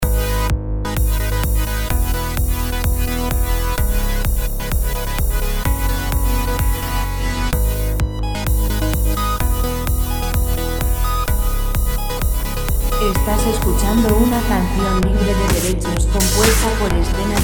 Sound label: 128 dance; background royalty free music